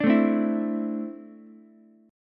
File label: D 7th Suspended 2nd down Ukulele; D7sus2 strummed